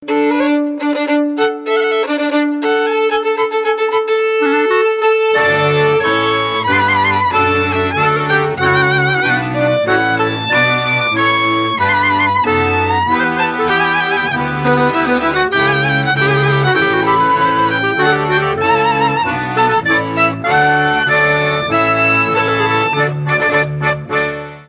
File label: march